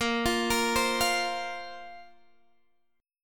A sharp Suspended 2nd A sharp down Guitar Half Step Down